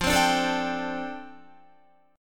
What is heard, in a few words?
F 6th Add 9th F down Guitar, chord; F6add9 strummed